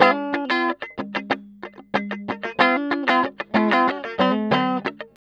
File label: Tele Dual F#3; Guitars; TELE DUO